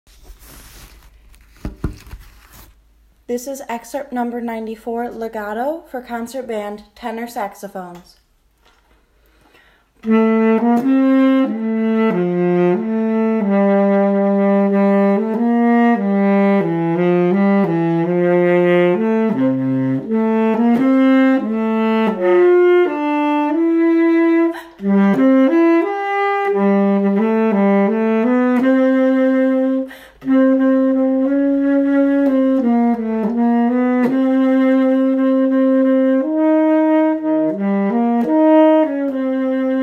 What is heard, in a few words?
t sax excerpt